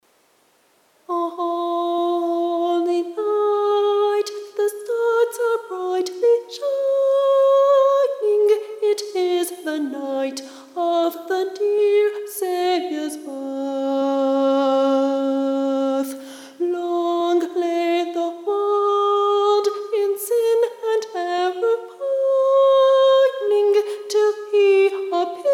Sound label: Soprano I